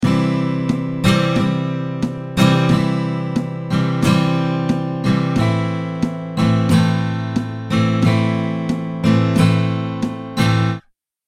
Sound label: strumming pattern